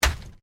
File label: ORGANIC PUNCH; Various Organic Foley sounds; Combat Swings; Sound Effects:Combat; Foundation3